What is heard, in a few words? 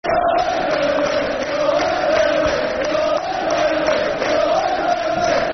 We All Hate Burnley [Football Chant (Soccer Song)]; Blackpool Bfc